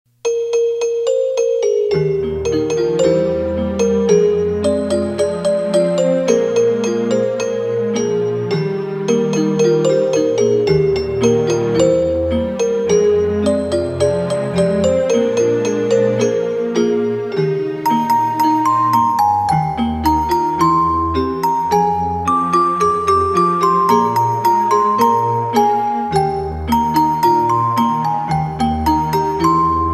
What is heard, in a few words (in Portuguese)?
musica para bebes; capelinha de melao